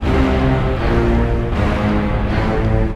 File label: strings, Sad